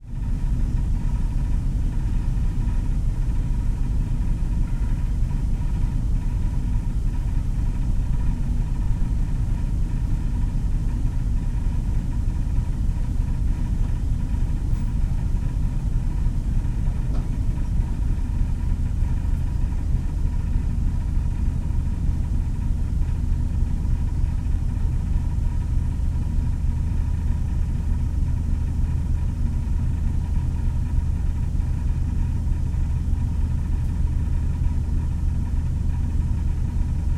Boat, Ferry, V Class, 9 Ton, 8500 hp, Washroom, Vent Rattle, Pipe Hiss